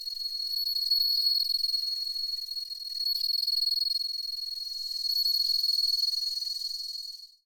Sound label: noise